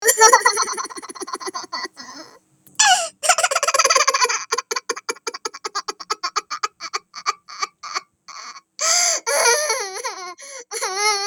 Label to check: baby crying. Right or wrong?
Right